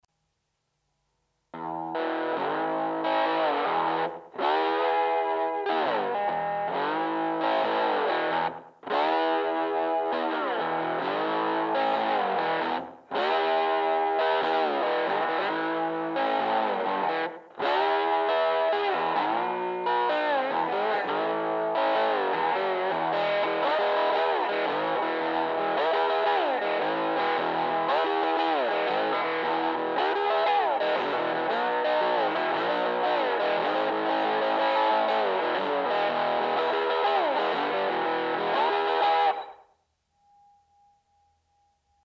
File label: hodad pick slide gain echo